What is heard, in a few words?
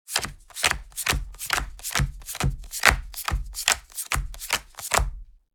Board game dealing cards; leisure